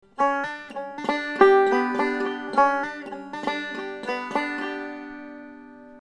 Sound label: hammer